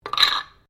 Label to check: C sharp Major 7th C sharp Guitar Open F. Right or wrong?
Wrong